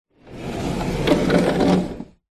household door internal wood sliding open or close